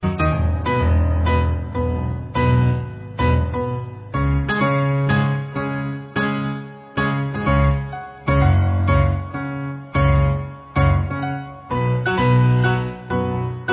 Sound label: Jangly Piano